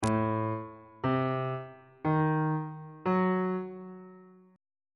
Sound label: a sharp harmonic minor 7th chord 7 is g double sharp diminished 7th on bass clef